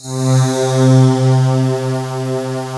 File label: POLLEN, SYNTH; East West; Global Sounds